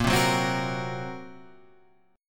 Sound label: A sharp Suspended 2nd Sharp 5th A sharp down